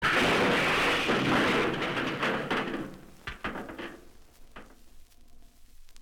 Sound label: junk crash; disney